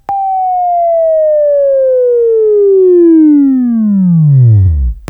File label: Buzz, Sweeping Training